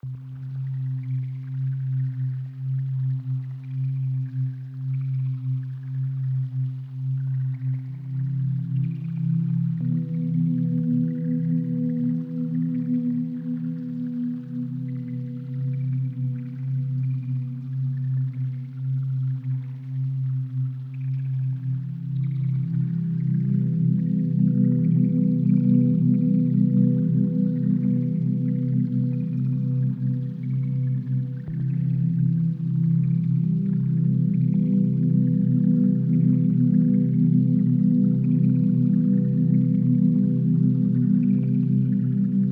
Relaxing Spa Music Calming Sounds Rainy Etude

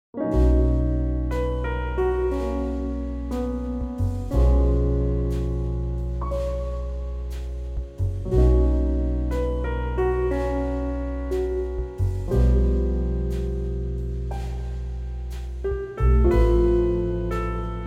Relaxing Jazz Trio

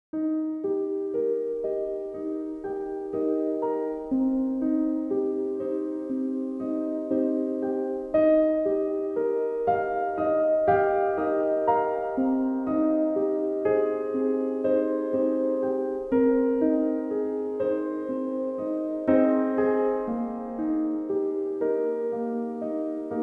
Piano Music

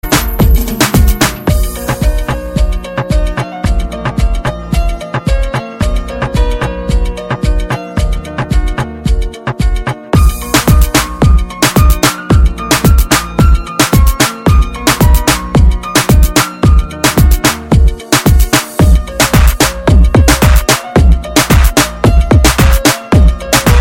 Piano

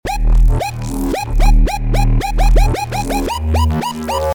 Trap Short SMS

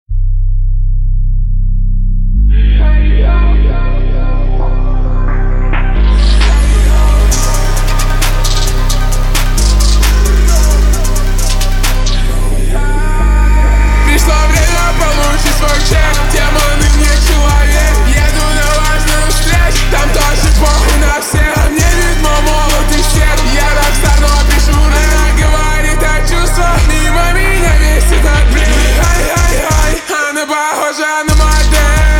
slowed reverb

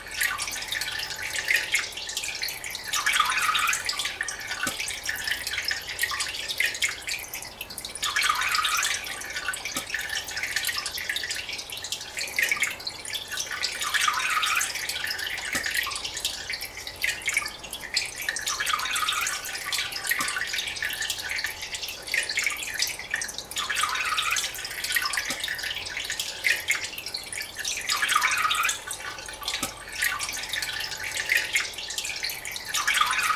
White Noise

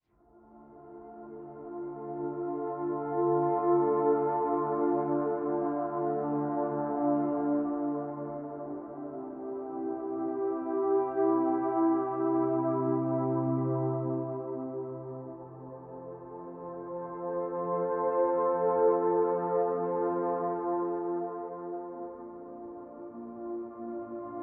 Soothing Pipe Melody

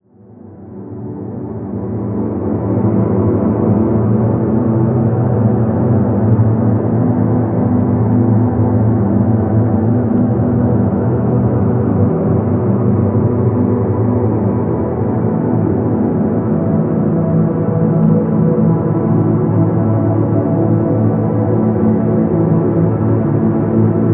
Relaxing Dog Music

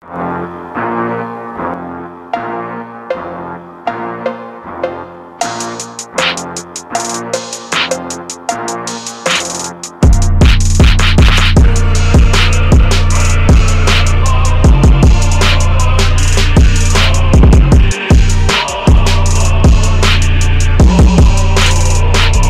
PHONK

